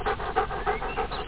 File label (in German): Hund; spiele spiele spiele topware